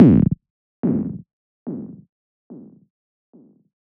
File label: Squelch D 144 BPM, Psytrance; Ghosthack; Psytrance Visions Volume 2